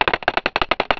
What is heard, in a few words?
tick